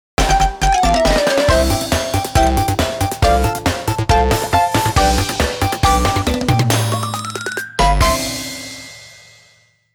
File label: One Ingredient Success; paper mario the thousand year door